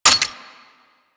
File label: Lever Action Rifle Mech; Grineer